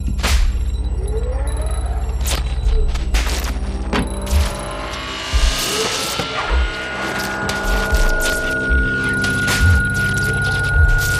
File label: Zaps Hums Tones; The Producers Library